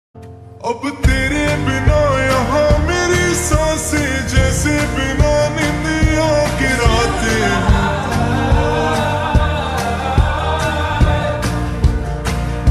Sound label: Ringtone Slowed Reverb